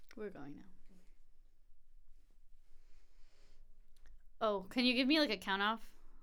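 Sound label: vocals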